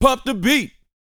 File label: VOX F, Vocal, R&B Dance; Spectrasonics Vocal Planet